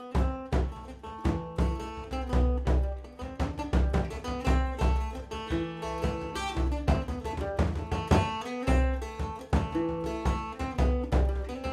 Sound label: Orchestra Ambience; Assassin's Creed III